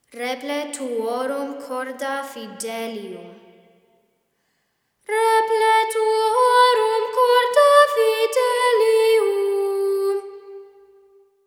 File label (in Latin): reple tuorum corda fidelium